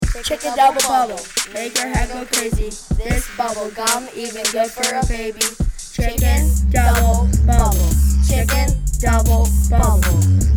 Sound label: Chicken Double Bubble; Frontier Middle School